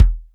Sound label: fat kick; Greytsounds